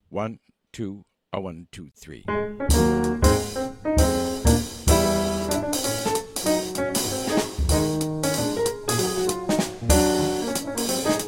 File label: minus Bass; count off sets up the intro; play figures/walk for the intro and melody; walk for the piano solo 2 choruses